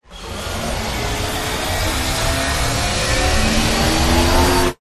Sci-Fi Power Up; Ryan Van Eerde

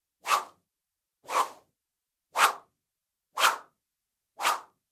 Small Bamboo Swing Slow; noise creations

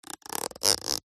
household comb fingernail run across teeth

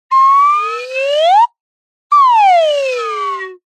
cartoon slide whistle up down med pitch; zapsplat

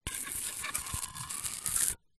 Metal Dry Ice Candle Lid Rough; sound spark

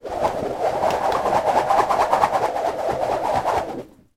foley rope lasso spin air fast; zapsplat

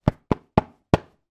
household woodburner fire proof gloves hands clap

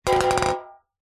tin metal cylinder lid on knock over on concrete; foley; zapsplat

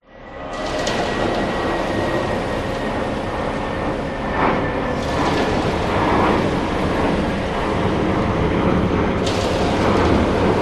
Beton Mixer Truck; USA; Orange County

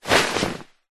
foley clothing shirt grab pick up

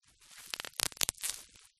office bubble wrap pops

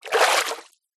nature water movement hand

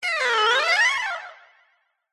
squeaky metal Designed Vintage; PMSFX

